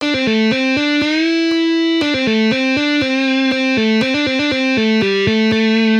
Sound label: Hammer ons, guitar